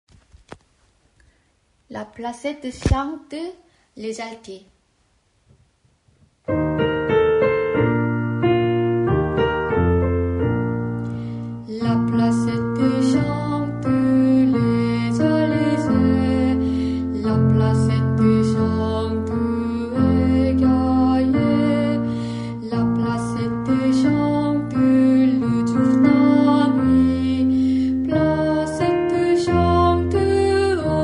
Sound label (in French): alto